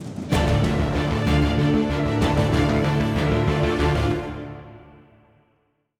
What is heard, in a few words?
190bpm; Legends of Pegasus; windows gamerip 2012